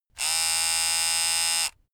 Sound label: razor short